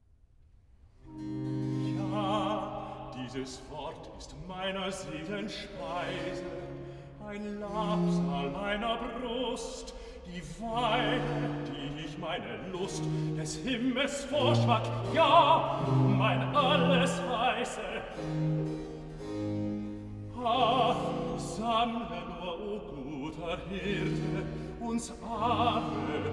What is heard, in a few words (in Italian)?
Recitativo (Bass); Cantata, BWV 104 'Du Hirte Israel, höre'; Bach; Echternach; 2nd Sun After Easter